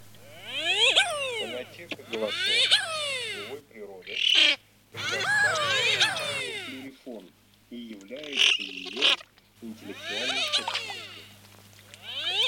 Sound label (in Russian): Свиязь Fischione; plurifon